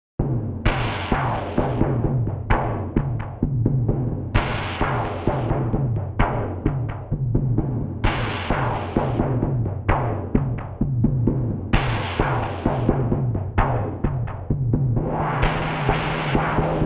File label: stix tribal cinema